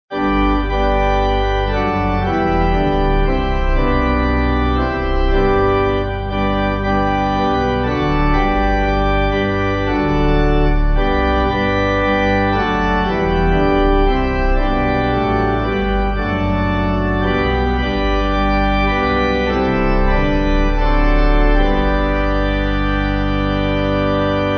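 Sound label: Pipe Organ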